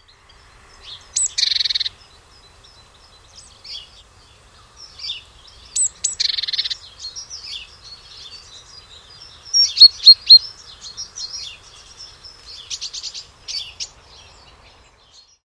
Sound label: great tit